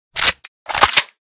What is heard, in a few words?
unjam, weapons; Armsel Protecta Reanimation; librarian striker